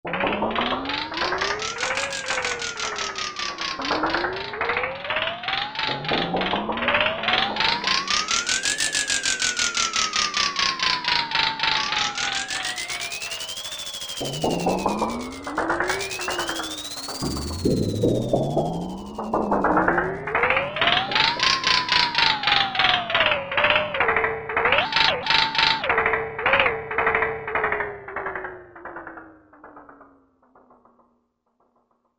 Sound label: Toy Cycler; Korg MSC-14S Sound Effects